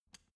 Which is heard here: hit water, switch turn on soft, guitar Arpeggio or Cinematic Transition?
switch turn on soft